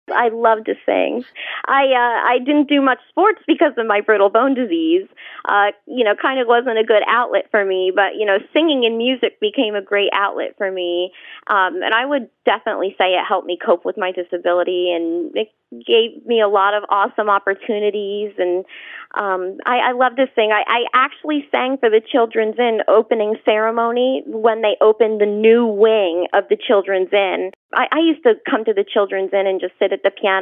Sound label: singing